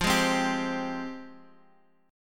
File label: F Minor Add 9th F; Fmadd9 strummed; JGuitar